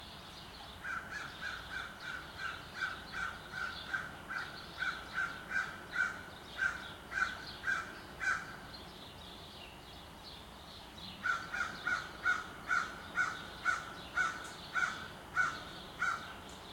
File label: AMB SUBURB Crow in City, Sparrow Chirps, Montreal, Canada, LOOP; Articulated Sounds; Nature in the City